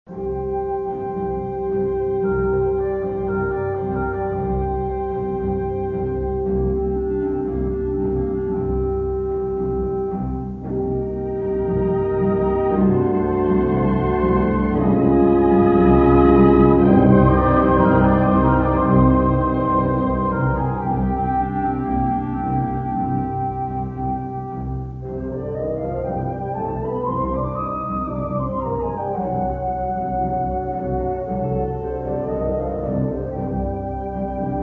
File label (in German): Bolero; am Manzanares